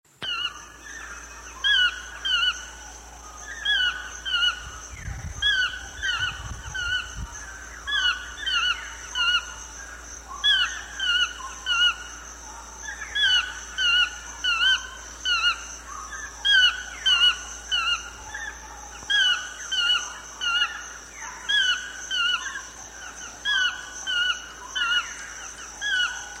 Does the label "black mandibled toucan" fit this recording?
Yes